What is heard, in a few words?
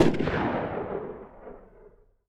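shotgun exterior shot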